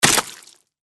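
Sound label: sounds of people fighting and a punch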